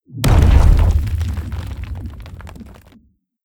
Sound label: supply land close; cstrike; pubg